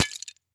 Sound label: small break